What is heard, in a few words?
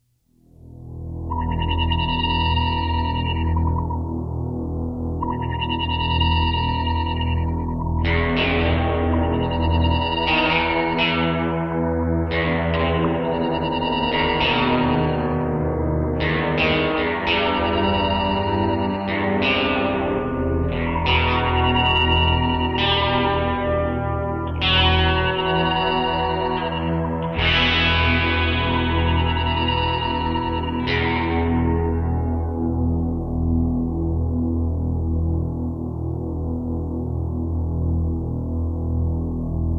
Echo